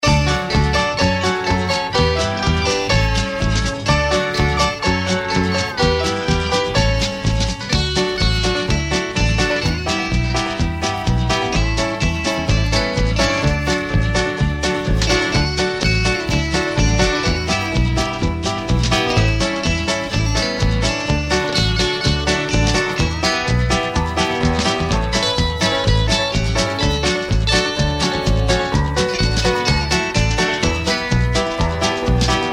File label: music